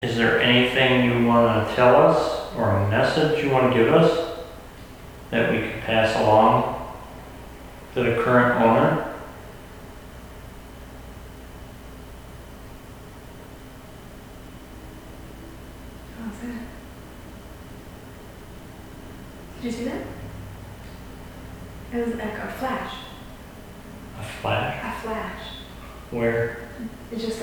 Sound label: Sigh Or Moan; Prior To Flash Of Light; EVP